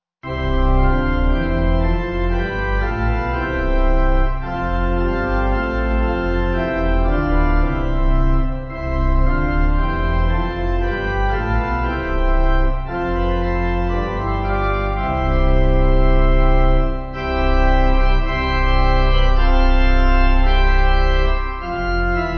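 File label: Pipe, Pipe Organ; Worthy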